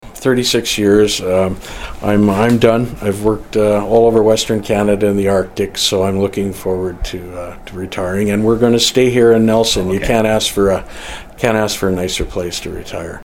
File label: Tom